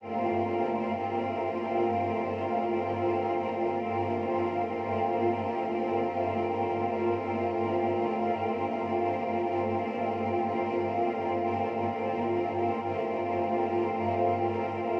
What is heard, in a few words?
granular, pad; M8